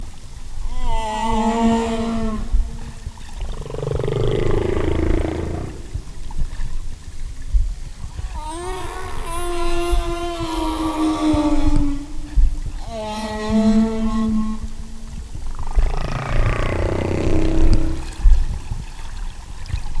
small singer

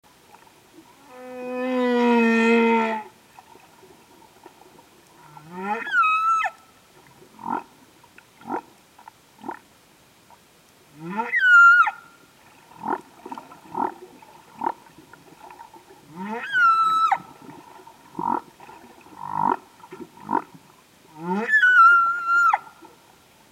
humpback whale singer full song, tonga

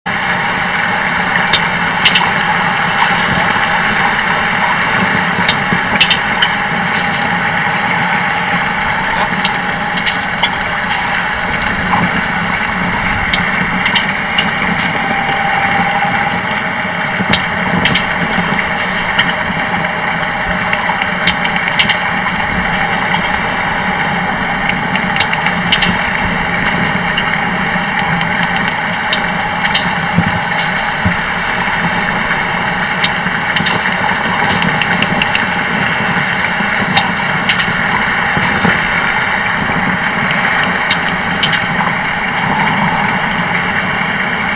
sperm whales

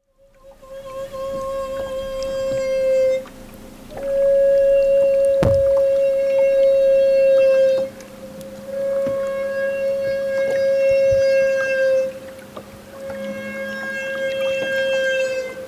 humpback whale bubble net feeding alaska

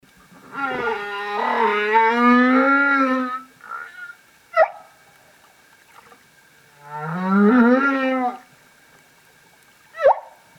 humpback whale singer, tonga

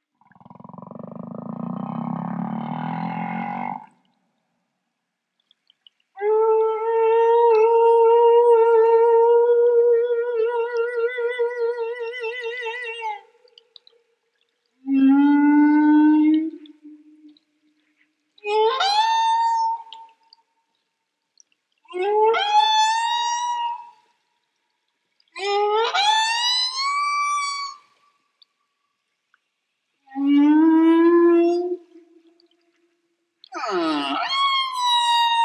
humpback whale singer audio recording

